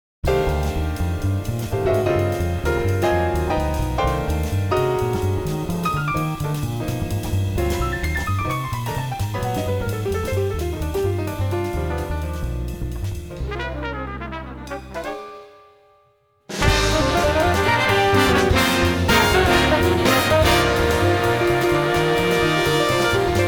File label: jazz